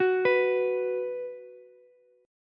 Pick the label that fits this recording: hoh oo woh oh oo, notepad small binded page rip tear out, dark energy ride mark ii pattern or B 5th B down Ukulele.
B 5th B down Ukulele